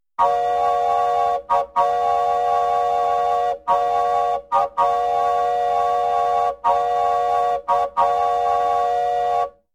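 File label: steam locomotive, diesel locomotive horn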